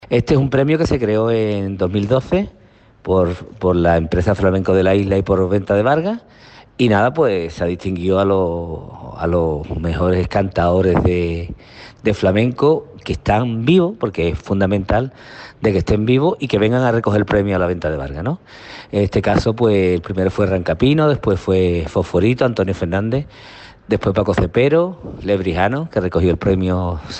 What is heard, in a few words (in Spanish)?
FLAMENCO